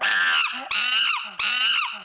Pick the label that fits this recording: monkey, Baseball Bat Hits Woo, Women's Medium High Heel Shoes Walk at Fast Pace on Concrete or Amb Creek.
monkey